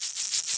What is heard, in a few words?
shaky shake